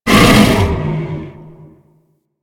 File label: Infested Bone Blade Vocal Idle C; Warframe